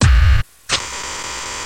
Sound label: Industrial Loop